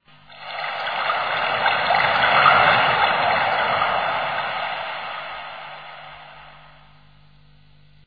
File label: water